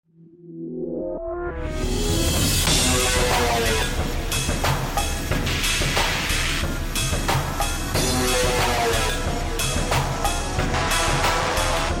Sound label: music; lobotomy corporation 2nd warning urn music; idol carry